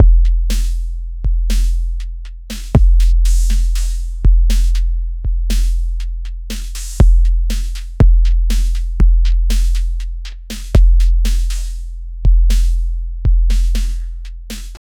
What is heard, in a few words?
clear punchy electro r&b, drum